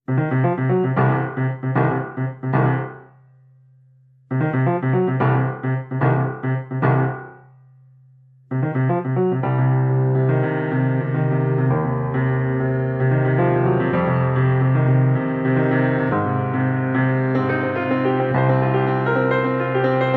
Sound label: Piano